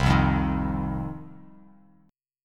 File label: C Minor Double Flat 5th C down Bass Standard; Cmbb5 strummed; JGuitar